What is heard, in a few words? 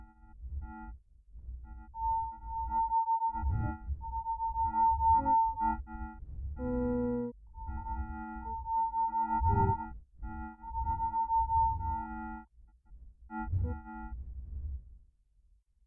sonification example